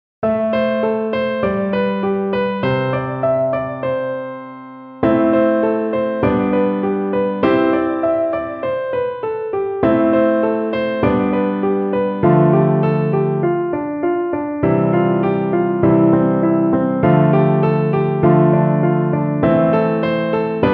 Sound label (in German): glockenklang